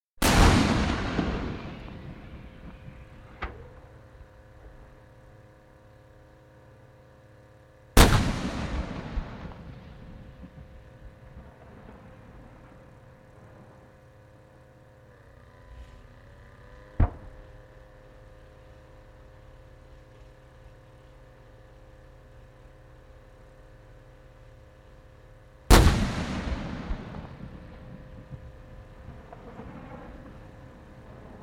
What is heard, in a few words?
Chieftain Tank, firing four High Explosive Squash Head (HESH) rounds (Close perspective); Guns Tanks; BBC